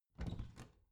bathroom cabinet door creak open heavy

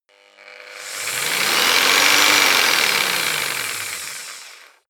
jigsaw engine medium slow medium length

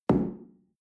shell place on tablecloth medium; soundpool

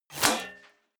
bullet small impact metal; krieg-waffen; geschoss

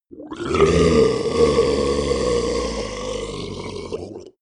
monster die gurgle low; kreaturen

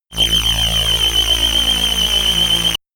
shot annoying tooth low; zaps buzzes

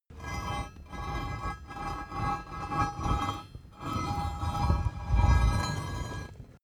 metal scrape long

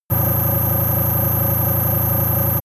beam stasis, energie

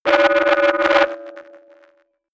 pitch jump rumble short, sciene fiction

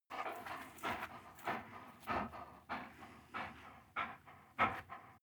dog pant slow low; natur-tiere; hunde

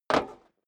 step boots single metal dry single stair up; Footsteps; schritte

